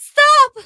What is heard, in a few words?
Discard